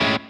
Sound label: Amaj7 Staccato